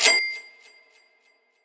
cash register

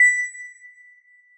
Bell B7

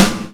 Snare Hit Hard

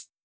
soft hit normal

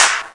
Clap

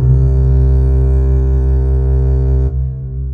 Lower bass C

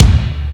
drum bass

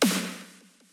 snare; osu

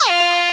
Lead

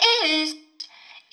vocal

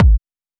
soft hit normal

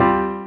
Synth; osu

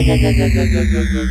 Radio FM Drop

